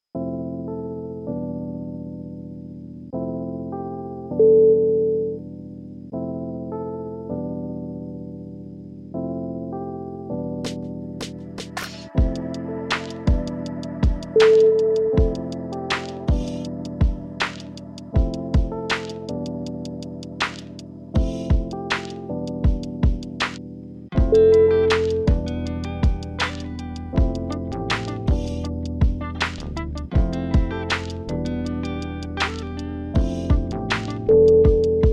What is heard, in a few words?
lofi hip hop